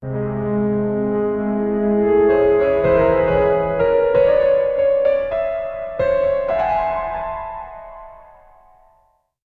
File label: descending sad chromatic